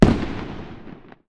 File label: burst, firework